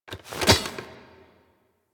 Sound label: Queens Chamber Foley Stunned; Teshin; Warframe; The War Within